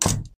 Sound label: door